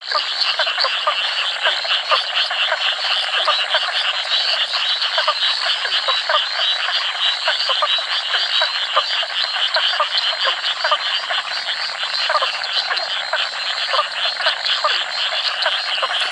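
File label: limnodynastes terraereginae, frogs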